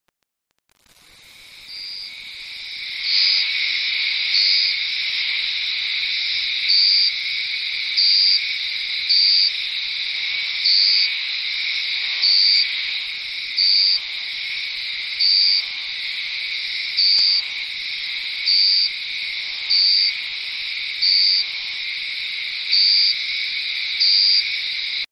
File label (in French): sons guyane